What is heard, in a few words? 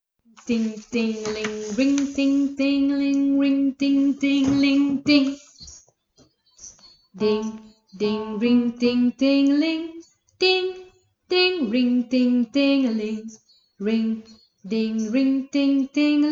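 MEZZO SLEIGH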